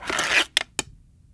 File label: Arrow Spawn; SteamVR; InteractionSystem